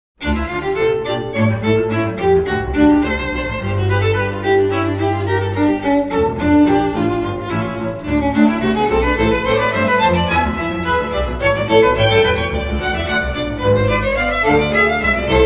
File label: Harmonic Lick